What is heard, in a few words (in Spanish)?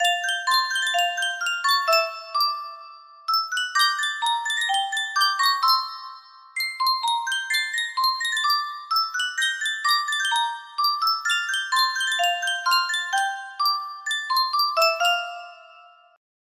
Yunsheng caja de musica, music box melody